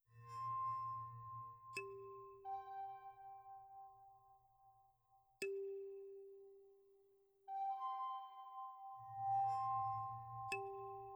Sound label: Instrumental